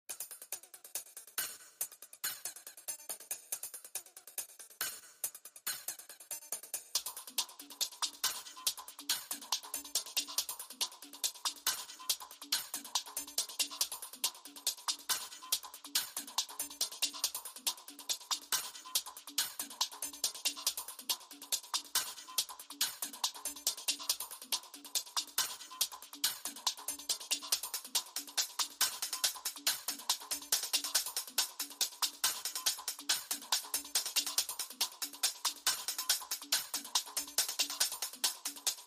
Drums and Bass

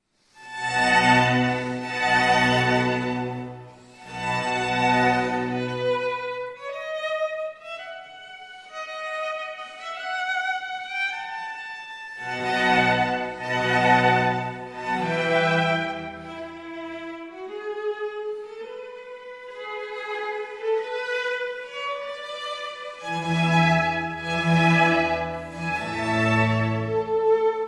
Concerto for 4 violins cello strings & bc; in B minor; Concerto No 10; Antonio Vivaldi (PD)

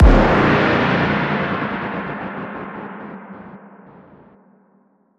One Shot Hard Impact

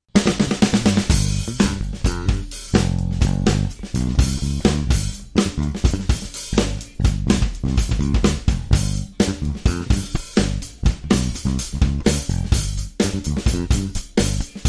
Drum & Bass

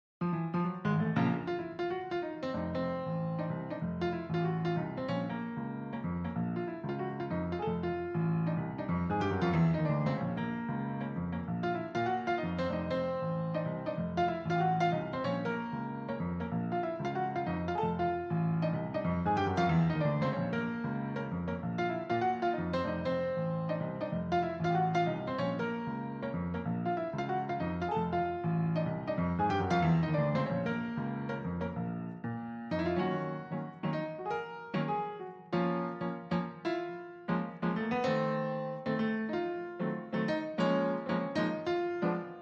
Piano Clean

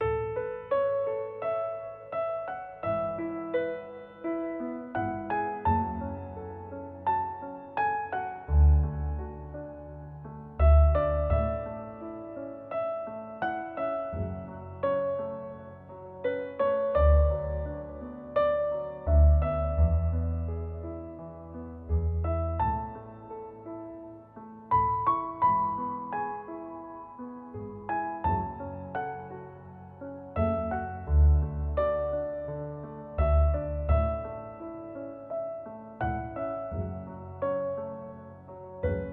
Piano

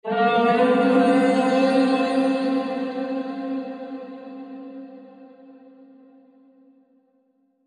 Animal Call Bend

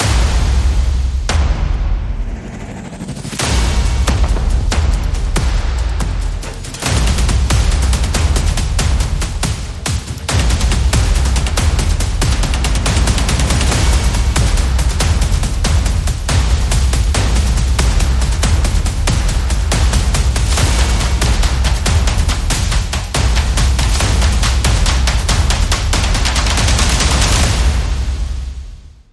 Encounter, Percussion

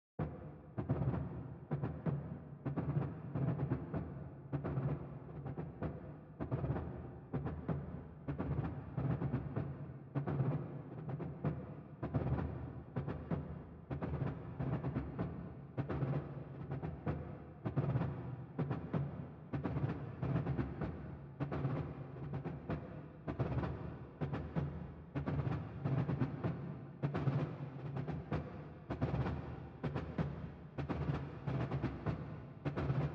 Snare Only

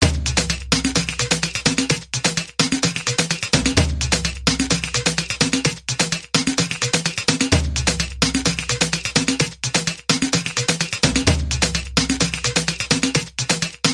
Tribal

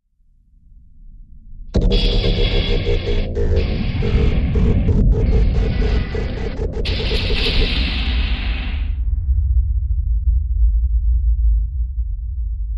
Sub Quartermaster

